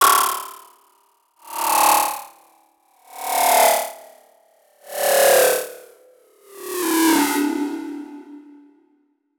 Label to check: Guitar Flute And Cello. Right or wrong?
Wrong